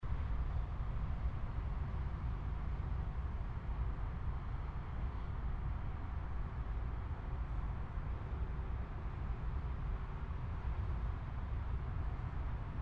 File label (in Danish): Motorvejsstøj optaget på yderpladsen i stillebænken